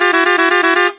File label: horn; TrackMania Nations Forever; Skins